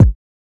kicks

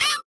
chant

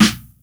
SNR

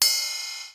Percs

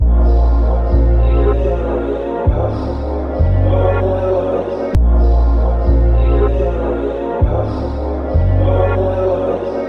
96 BPM

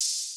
Open Hihat; Cymatics

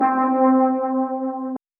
lead one shot

